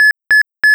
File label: Three beeps, School Bells Tones